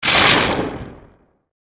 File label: element thunder; maoudamashii